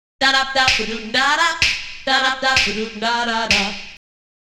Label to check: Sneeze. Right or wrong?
Wrong